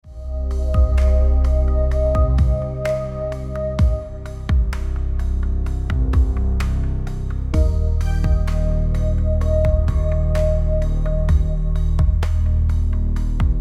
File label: bgm; acgo 天梯音乐